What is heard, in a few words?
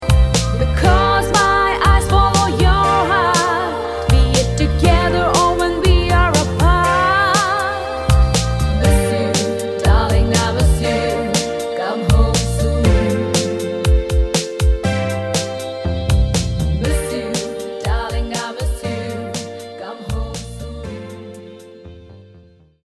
MISS YOU ENGLISH FEMALE